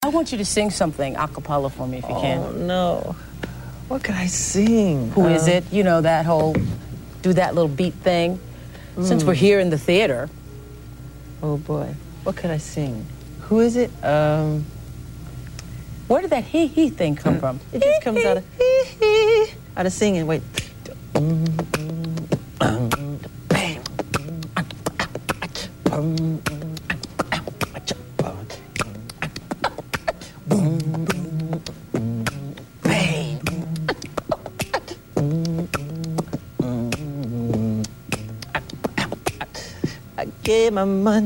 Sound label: Acapella; Oprah Winfrey